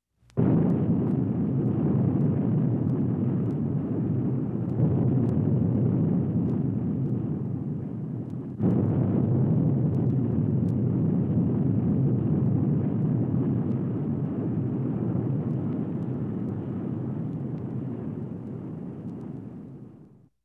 cochete

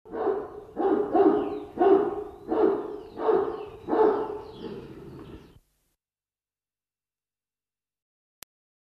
un perro ladrando

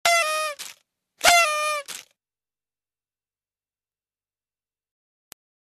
pito confeti

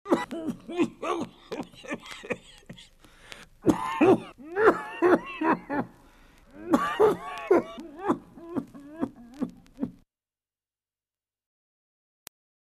tosiendo

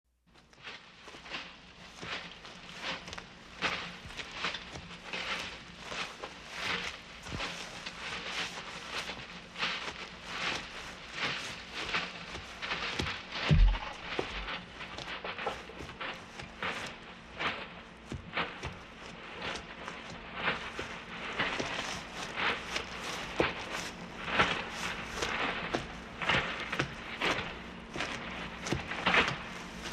patrulla caminando

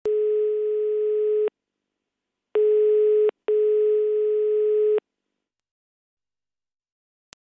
linea telefono

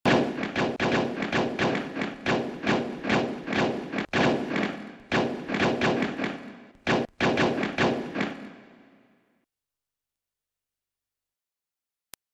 pistola

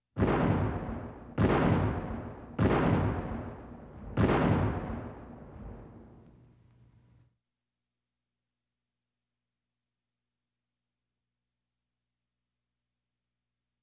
tiros

